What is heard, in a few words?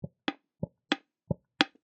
Oil Can Multiple, Times, Metal, Buckle, Antique; Industry, Oil, Can, Three, Times; Hand Tool; BlastWave